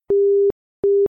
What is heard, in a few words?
Tone Congestion UK